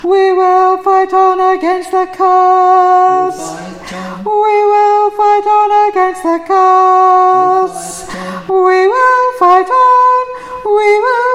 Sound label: tenor